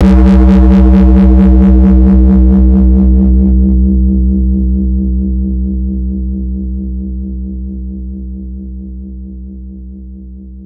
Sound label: Opticalish, Basses; AMG - Prototype Drum & Bass